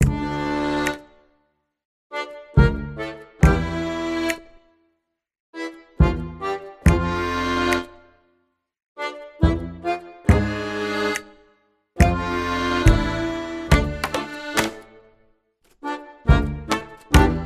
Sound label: mischievous pirate sneaking; Tabletop RPG Music